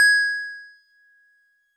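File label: Wood Piano DX100 G#1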